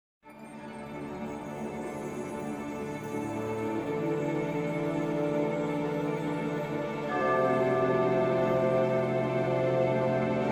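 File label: Music Cue Secret Contrabassoon; Crusader Kings III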